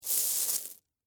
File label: Rocks Pick Up, Foley, Rocks, Pick, Up, Handful, Pebbles; Materials; BlastWave